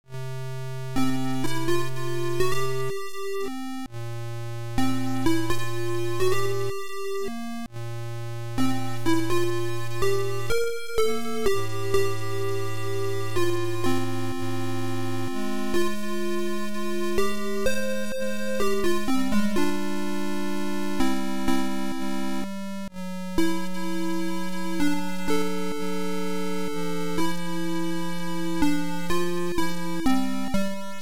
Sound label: Coins Coins; Floopy 02; Infomedia